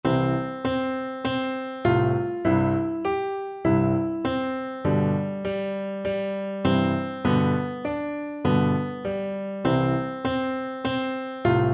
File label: bobby shaftoe, piano duet